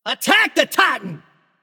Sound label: vox attack m; smite; baronsamedi